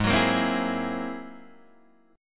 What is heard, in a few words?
G sharp Augmented 9th G sharp Guitar Open D